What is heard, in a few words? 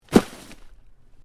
Body Movement Snow Drop; Body movement foley to simulate falls and deaths; Drops (Sound Effects:Objects)